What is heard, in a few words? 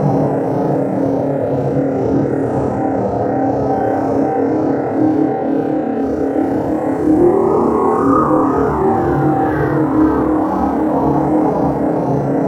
Tractor Beam Bridge Amb; Space Bridge Transition